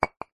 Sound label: nature ice block small set down